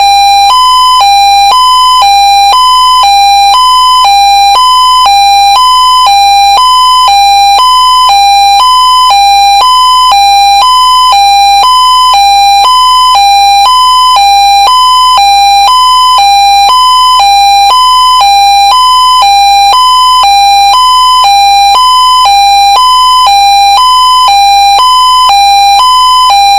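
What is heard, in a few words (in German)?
Warnung, Alarmtöne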